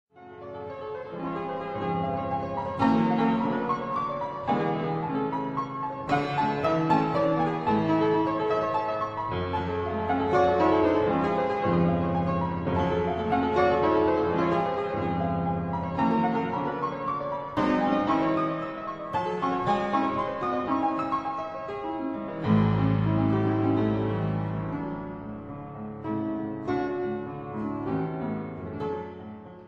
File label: Keyboard Sonata in A minor, Rondo piu tosto Moderato